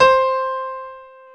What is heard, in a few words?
PIA PRO, Roland